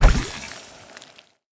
Tree Boss Nose Hose

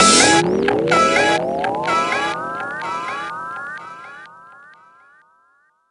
Music Dance End; game rip; littlest pet shop garden jungle winter; nds

